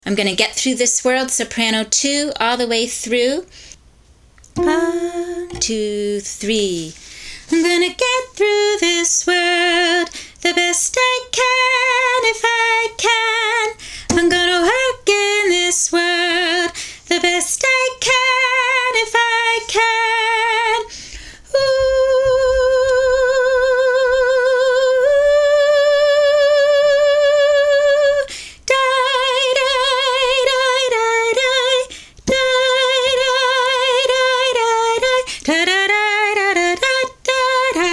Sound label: Gonna Get Soprano 2, chorus; all the way through